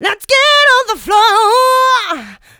voc, female vox, Vocal House

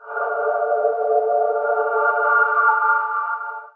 Trance Sweeps; Vengeance Samples; VEC2 FX

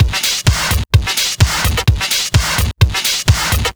House Beat, 128 BPM

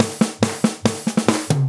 Fill 140 BPM; Loops; Vengeance Samples

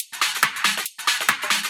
Loop 140 BPM; Vengeance Samples

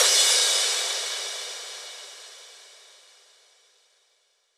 Cymbals crash; Vengeance Samples

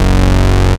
Bass Cuts; Vengeance Samples; VES1 Instruments

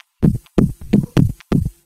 Electro Loop 128 BPM; Vengeance Samples

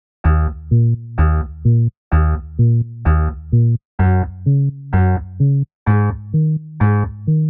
128BPM Bass Root D#; Vengeance Samples; Basket Kit

